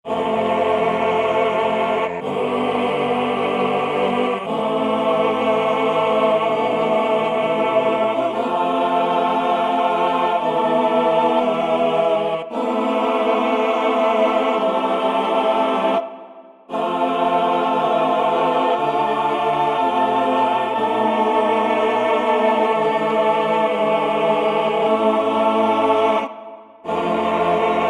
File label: Mellotron Male Choir; synthesizer; Guess the synthesizer game